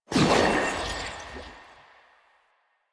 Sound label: Dagger Proj Loop Start; Duviri; Incarnon Adapters; Incarnon Okina Prime; Weapons